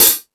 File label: High Hat, Drum Machines